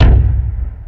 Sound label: boom; osu